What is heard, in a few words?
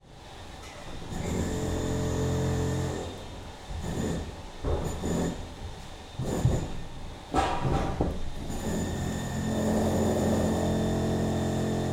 Amb, Construction, Int, Muffled, Power hammer, Drops, Impacts; Hzandbits; Construction Ambiances